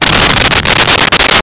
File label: impact snow